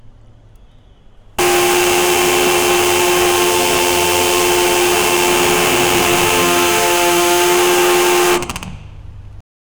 w16 loud ferrari f40; sports cars

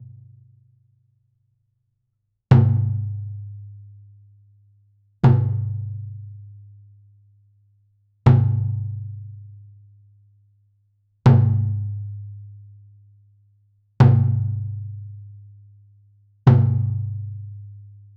drumming

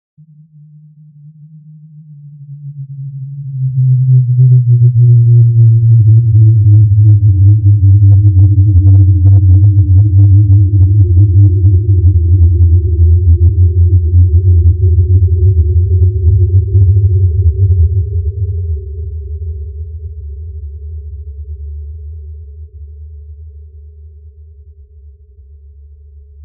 continuous sound of a, equipment

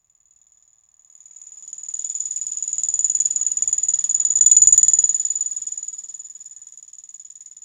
scissors sound with each; musical

